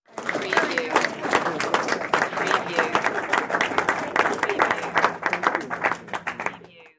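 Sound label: Small Crowd Clapping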